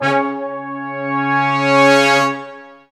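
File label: BRS SECTS, Large Ensemble, Ensembl.sfz; Roland LCDP06 Brass Sections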